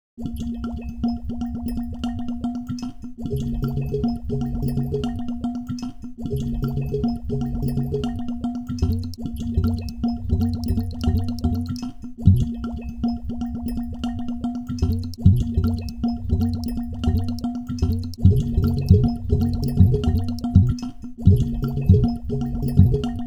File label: Blues